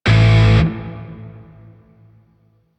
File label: Shawzin Maj Chord I; Grineer; DE; Warframe